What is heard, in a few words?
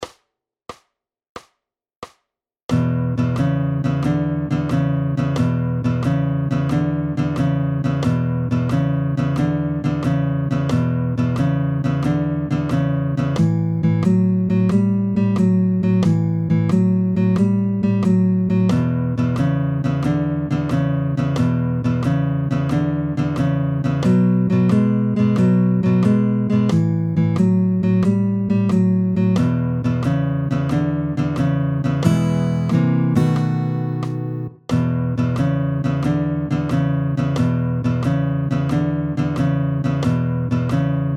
Boogie Blues Add 7